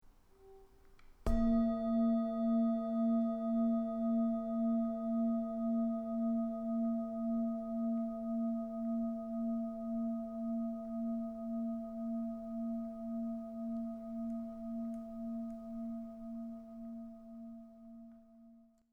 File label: Bowl